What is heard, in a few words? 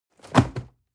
cardboard box medium fall high hard; objekte